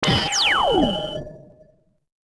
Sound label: long laser; Sid Meier's Civilization 4 Complete; Beyond the Sword; Final Frontier; Units